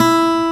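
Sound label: acoustic guitar E1; EL-GUT G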